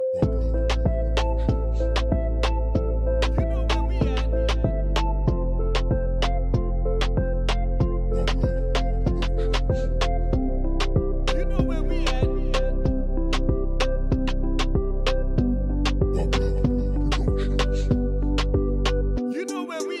Latin Reggaeton

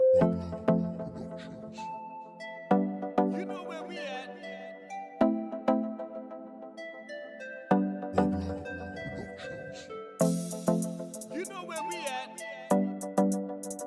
Latin Reggaeton

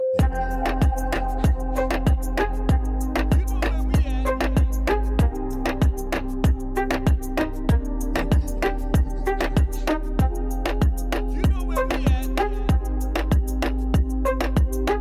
Latin Reggaeton

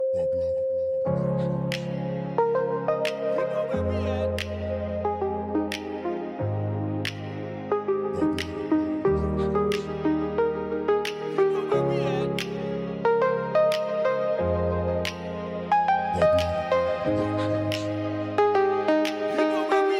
POP EDM

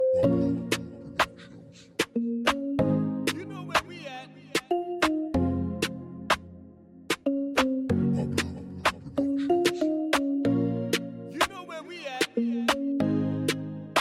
Latin Reggaeton

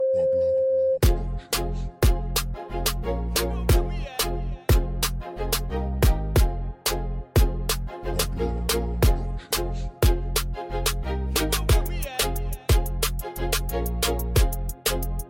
Reggae Dancehall

